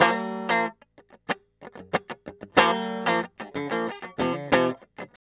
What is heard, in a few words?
TELE DUO; guitar; Spectrasonics; Hans Zimmer Guitars VOL-1